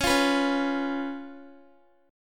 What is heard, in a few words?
Db 7th, Guitar; strummed; tuning=4E,4A,5D,5G,5C,6F